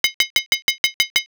stir

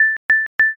timer done

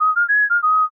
matsuri fue

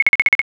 scroll

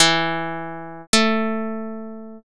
biwa

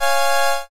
brass

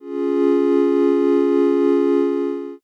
strings

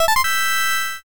join

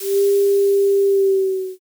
shakuhachi

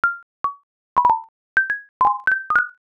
raindrop

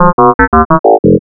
alien signal

